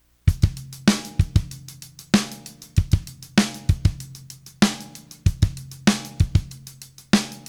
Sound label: drum orig, drum pattern 1; original signal; Separation of Sound Sources by Convolutive